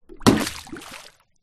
household watering can or container full of water small plastic throw land into; deep water